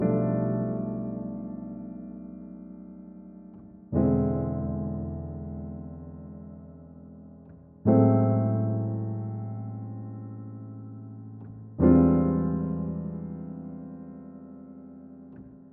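122 BPM Piano Chord Progressions Cinematic Drama C major A minor Tonic E; MMB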